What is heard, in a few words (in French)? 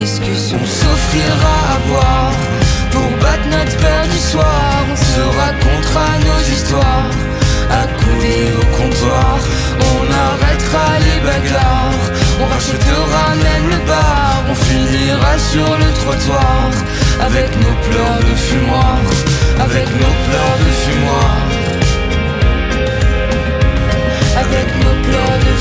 pleurs de fumoir